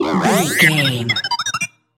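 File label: sci-fi droid robot emote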